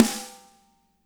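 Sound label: snare right; Spectrasonics; Burning Grooves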